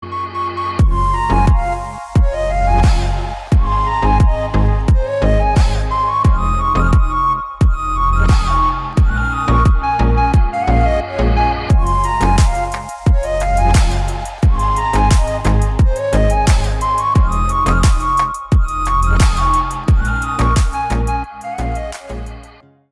Instrumental

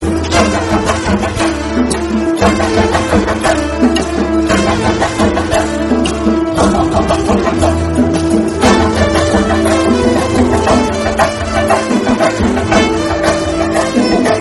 Violin Instrumental Bgm; Tamil

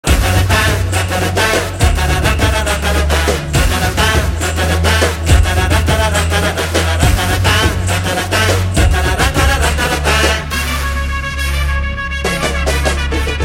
BGM Ringtone; A1 Express; Telugu

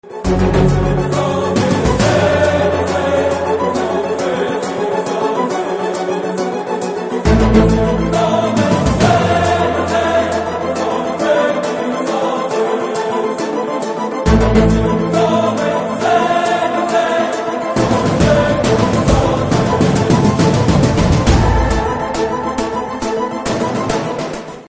Peace Meeting BGM Ringtone; Aravindha Sametha

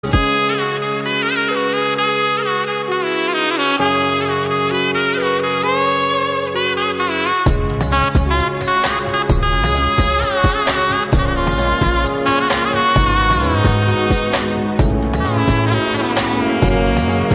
Love BGM, Telugu; Tuck Jagadish

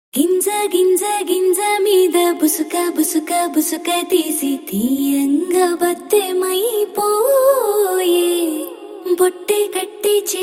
Song Female Verion Ringtone; Telugu; Kondapolam

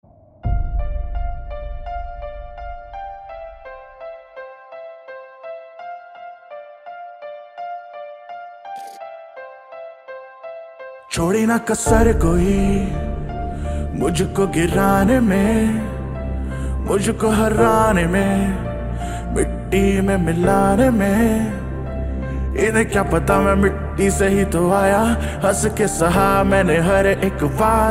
Instrumental and Lyrical Ringtone

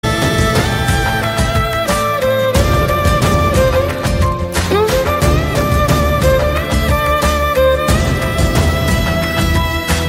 Love BGM, Telugu; Sreekaram

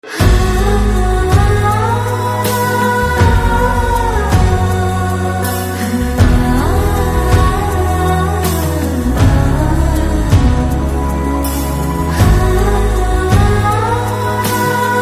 Humming Ringtone; Telugu; Next Enti

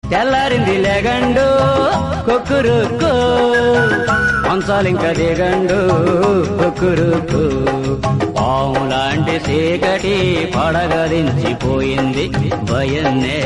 Ringtone; Telugu

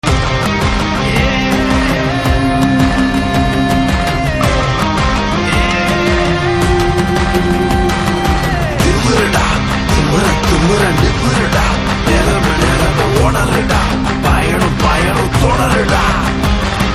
Theme BGM Ringtone; Soorarai Pottru; Maara; Tamil

